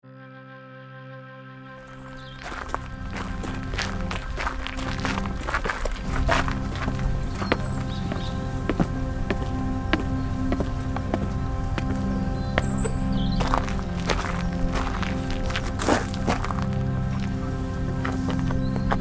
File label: Plantation Garden Soundscape